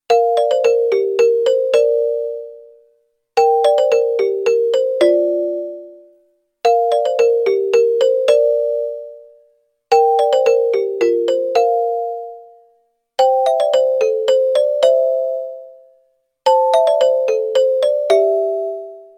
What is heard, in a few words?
ring; HMG Patient App